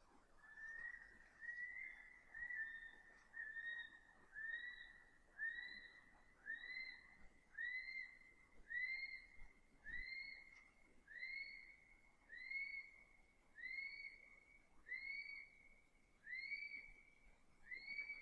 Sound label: male Kiwi; Mt Hiwi